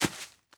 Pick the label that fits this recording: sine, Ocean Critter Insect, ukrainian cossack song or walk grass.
walk grass